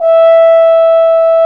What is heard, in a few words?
BRS F. HORN; Roland L-CDX-03 Disk 2; French Horn; F.Horn 1&2